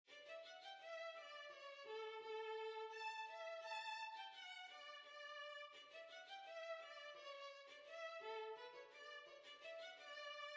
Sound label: Violin; Argiers